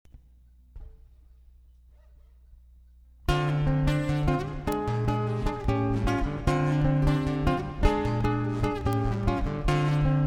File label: Brazilian Jazz Samba